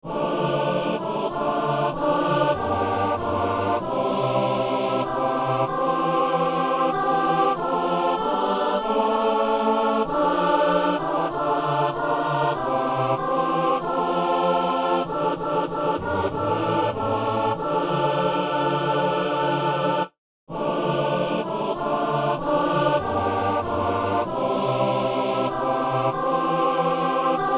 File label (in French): messe kyrie; messe de saint jean